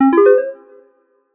Charging Started Fast Retro; Samsung Galaxy Z Fold 7

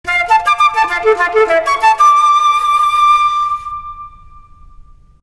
Startup tone; Vertu; Constellation Quest

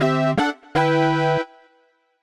Synth Brass; Samsung Galaxy S23; Retro

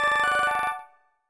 reminder; Samsung Galaxy Folder 2

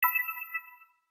Space enter application grid; Audio themes

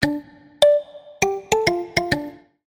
Fujara Overtone, notifications; Infinix XOS 15

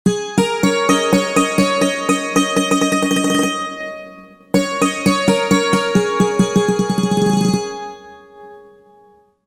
Morning Call; LG

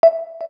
KEY XYLOPHONE 9, keytone

